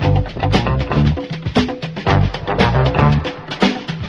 guitar and horns, Loops, Phonky

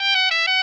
horns, phonky loops

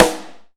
Snares, Rimshot

